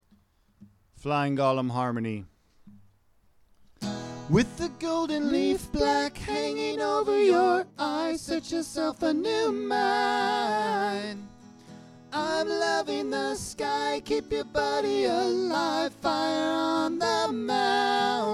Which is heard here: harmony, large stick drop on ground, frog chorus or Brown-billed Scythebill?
harmony